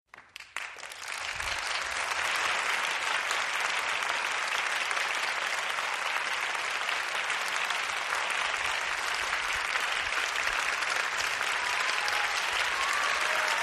800 People Theater Po, Theater crowd, horrified ooohs and screams in wav